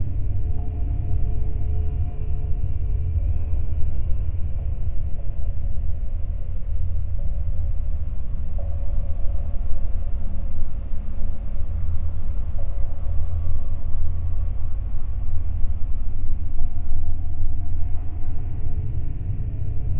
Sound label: Factory Night; Sonic Foundry (Sony Creative Software); Ma Ja Le; Soundworlds